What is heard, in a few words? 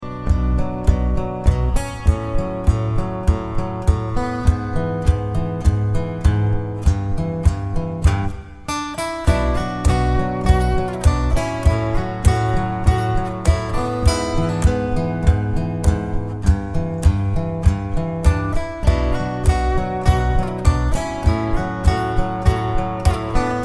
Instrumental Ring; Money Heist Web Series Ending